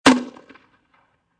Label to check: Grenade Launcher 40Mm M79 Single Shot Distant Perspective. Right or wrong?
Right